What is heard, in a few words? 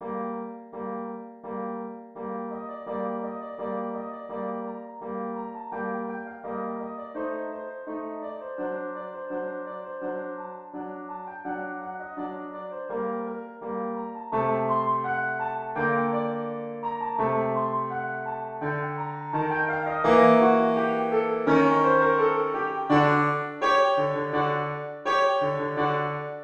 symphony piano